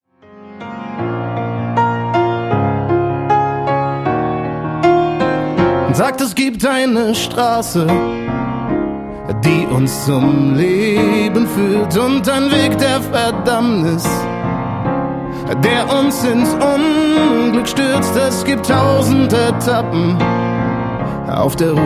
Piano Version

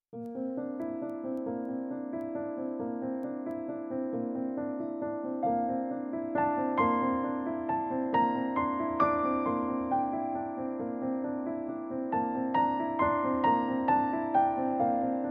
Halleluja, StudioChor; Childrens Corner StudioChor

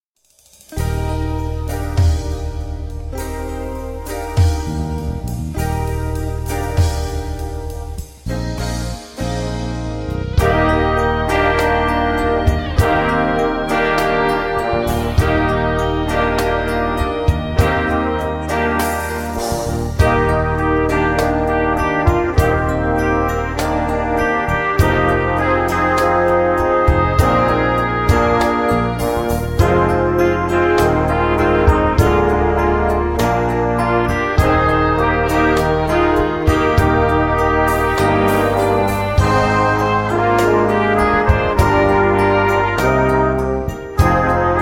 Brass

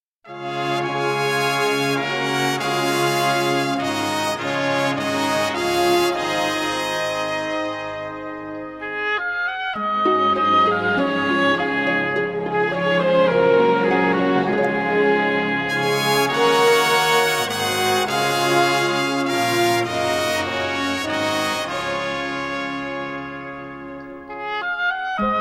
Strings and Brass

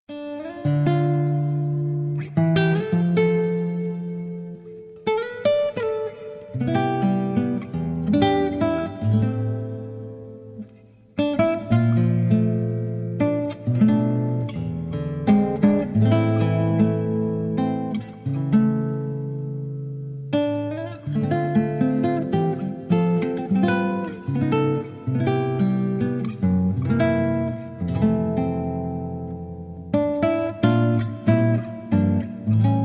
Instrumental

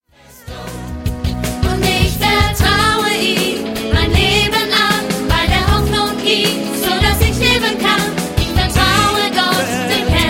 Gospel Celebration Choir

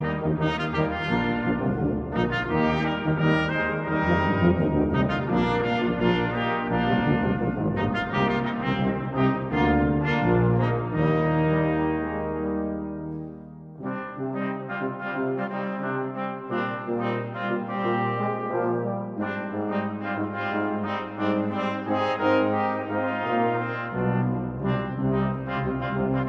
Rondovous for Brass

